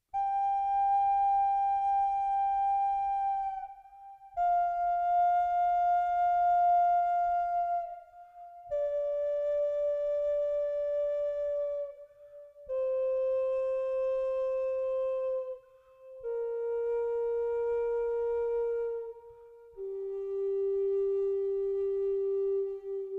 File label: scale, indian flute